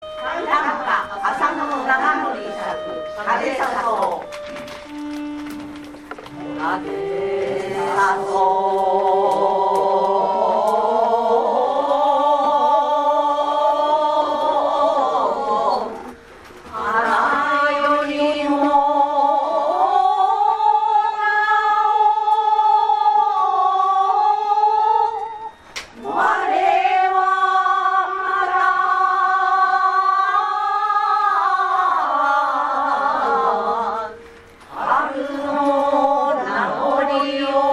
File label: gogin lady